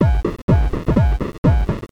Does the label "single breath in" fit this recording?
No